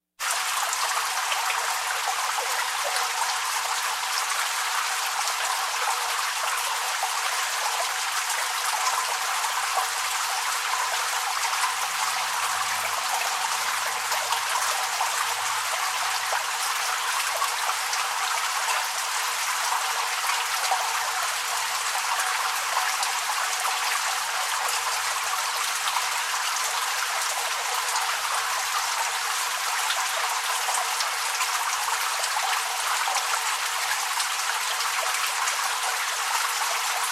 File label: Shower Running Lightly w Slight Reverberation; Household